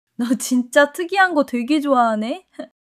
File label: laughter